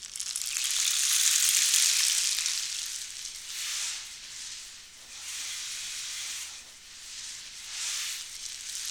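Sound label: percussion rain stick; Roland - Rhythm Section; Water Perc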